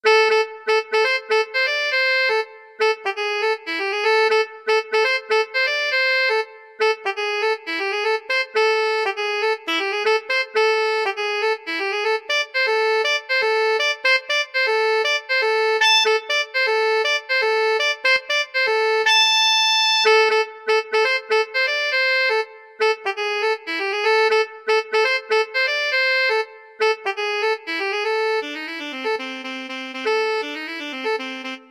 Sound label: Funk Study, saxophone